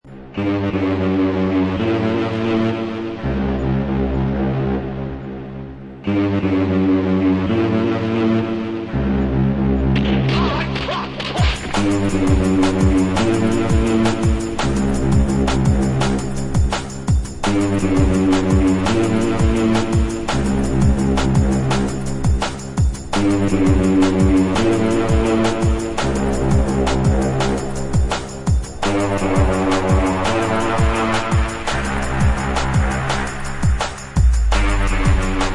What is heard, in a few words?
Drum Bass